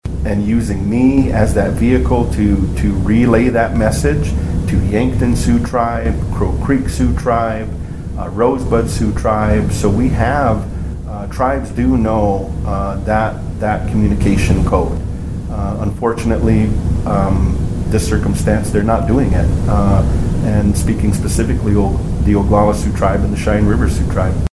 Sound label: Flute